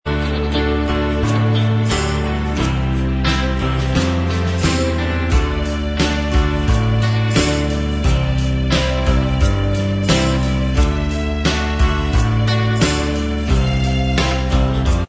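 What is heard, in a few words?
instrumental track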